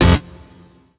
gambler lose; Fruit Fiesta; Wazdan

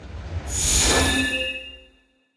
bonus fly in; Great Book Of Magic Deluxe; Wazdan

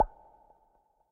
gambler mouseclick; Hot Party Deluxe; Wazdan

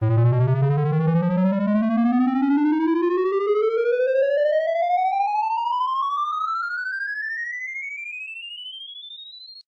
collect go; Plenty Of Fruit 20 Hot

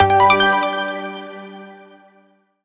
multiline win; Magic Stars 5; Wazdan

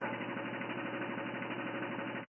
reel run clickering; games; poker; American Poker The Legends II

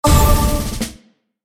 bonus chance; WolfMoon

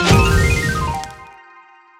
prize hit; Fenix Play 27 Deluxe; Wazdan

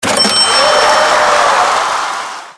wheel win; Grand X

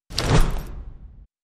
symbol whoosh; games; Aztec Gold Megaways

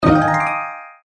bonus; Fairy Dust

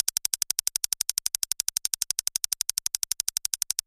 bigwin counter; Hot Party Deluxe; Wazdan

